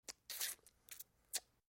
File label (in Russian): sosanie